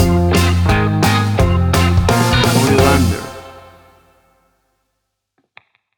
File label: 60s Mystery Man Stinger